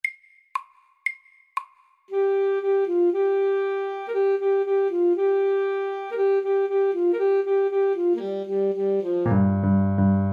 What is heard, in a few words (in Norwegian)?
Pentatonisk melodi middels